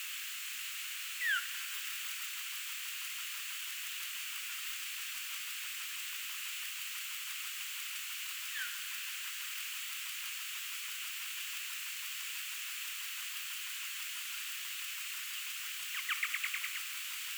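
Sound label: Nyctalus leisleri social, Tenerife Las Lajas; J-Barataud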